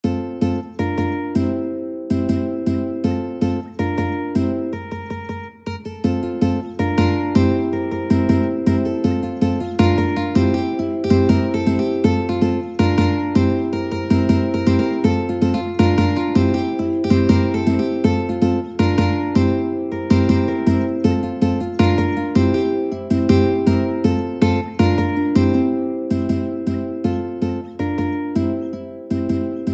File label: la bamba, ukulele duet